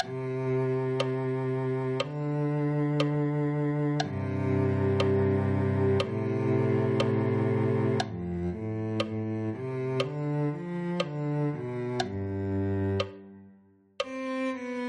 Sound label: cello